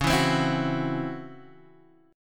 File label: Db Minor Major 7th Double Flat 5th Db down Guitar Half Step Down